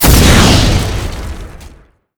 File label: laser sentry destroy